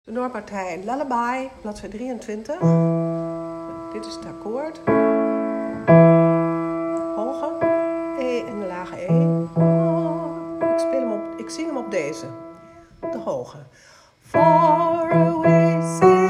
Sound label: tenor; Lullaby Elgar